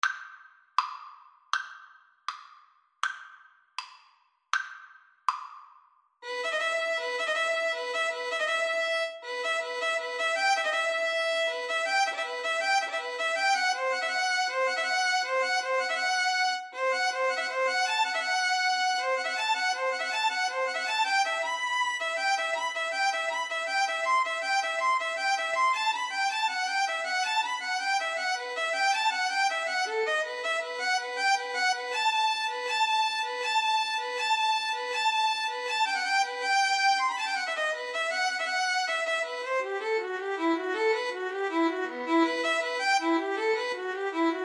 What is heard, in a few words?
violin cello duet; rappel oiseaux